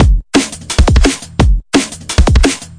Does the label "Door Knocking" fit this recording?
No